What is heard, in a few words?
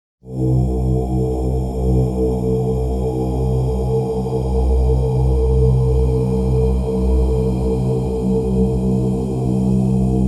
Tibetan Chant Stretched x3 Bassy Tail